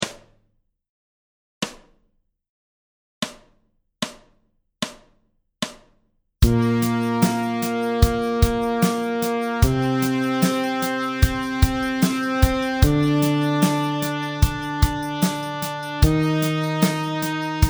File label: Bb Major Scale Slow